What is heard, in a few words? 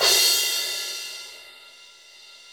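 cymbal crash; Best Service Gigapack 2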